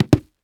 Foley Footstep Cowboy Boots Dirt Debris Jump Double; Foley Footsteps